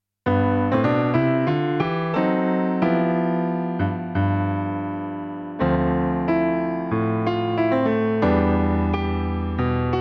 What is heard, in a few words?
karaoke